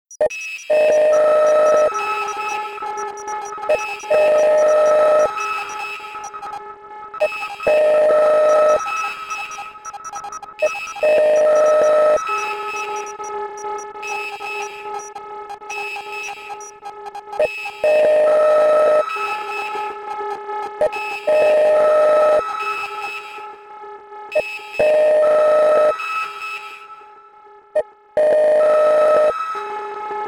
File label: SINEWAVE